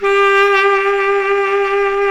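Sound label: saxophone baritone saxophone; Roland - LA Composer vol. 1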